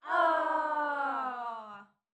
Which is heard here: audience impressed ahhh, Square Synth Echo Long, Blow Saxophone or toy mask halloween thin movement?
audience impressed ahhh